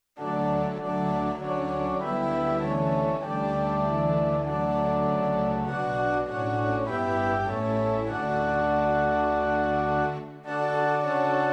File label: Christmas Hymn